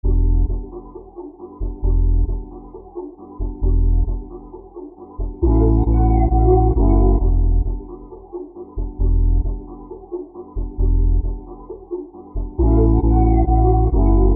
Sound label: bpm134